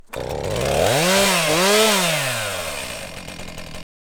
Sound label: chainsaw gas big engine turn on and off mono; SoundHolder